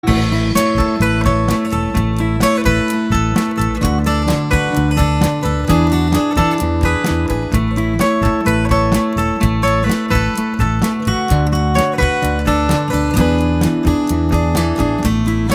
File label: Instrumental